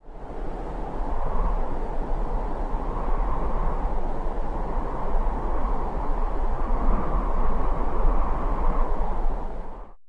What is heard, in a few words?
desert